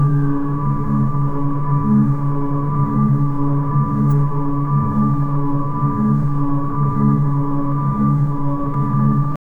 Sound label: sci-fi laboratory with beeping machines and hums